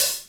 hot open hi hat one shot f key

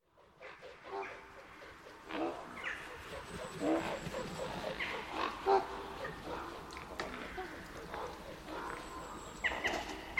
ravens and jackdaws calls

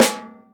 snare sound c key

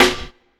shiny acoustic snare f sharp key

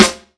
00s clear snare single hit g sharp key; Mono

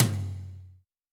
hi tom drum one shot g key; tom drum samples

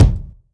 kickdrum d key

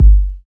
round kickdrum sample g key

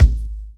low tone kickdrum g sharp key

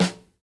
warm snare drum f sharp key

